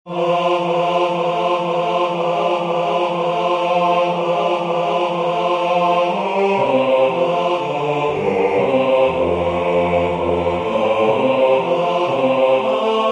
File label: Rocking Carol bass; Christmas; Waiheke Island Choral Society